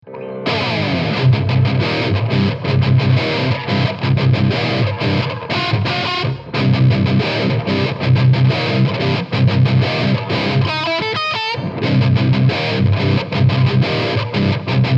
X-DRIVE DIODE; xgear

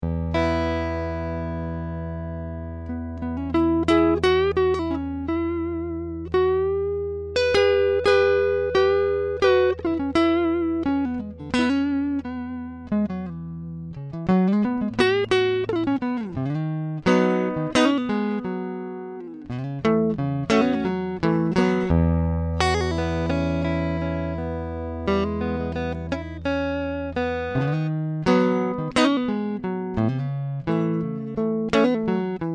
Vibro King; fender

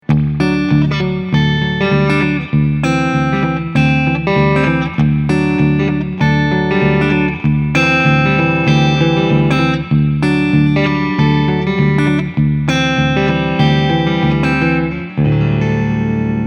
65 Deluxe Clean Arp; fender

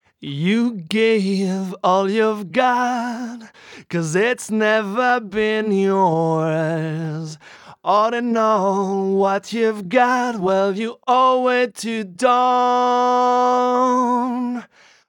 Mic Room 58 to R121

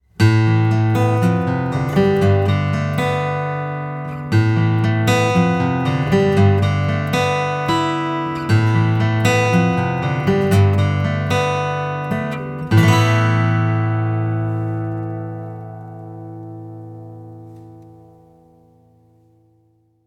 seagull piezo mic; iRig Acoustic Stage

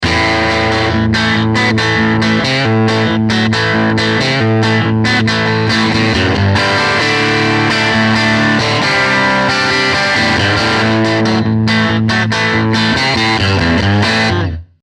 59 Bassman Rock; fender

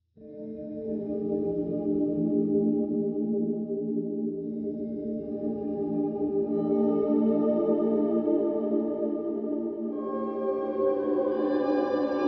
X SPACE SHIMMER; xgear

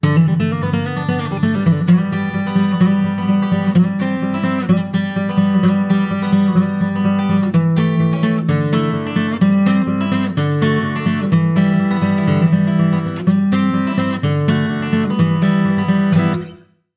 Acoustic Guitar Unprocessed

